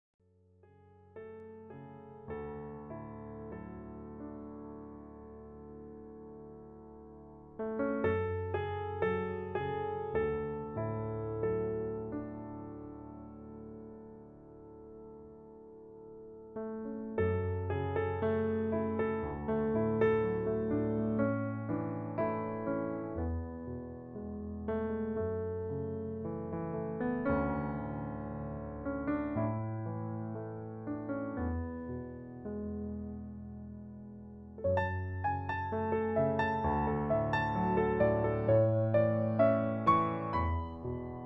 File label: Piano Feelings